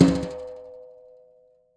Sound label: Maple Brush, snare; Drums; Ghosthack - Free Snares 2023